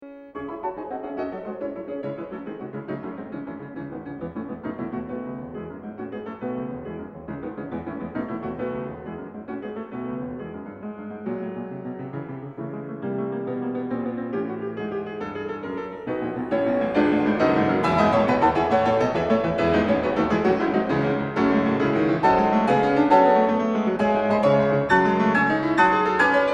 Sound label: Symphonic Etudes, Piano; Schumann; Op. 13 - Etude #9